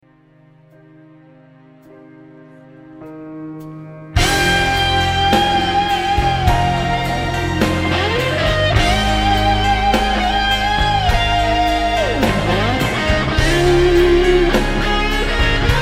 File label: solo Sovtek Big Muff, inner ear monitor source; Sovtek "Civil War" Big Muff; The Forum; Inner ear monitor mix 2016